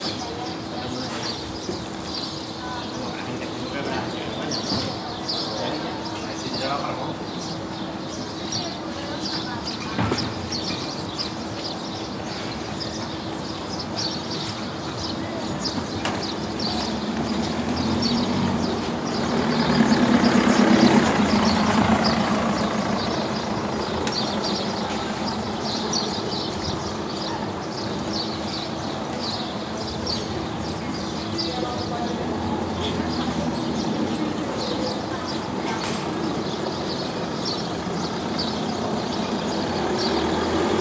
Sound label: Town Square, Spain; Jake Fielding; Sounds of Spain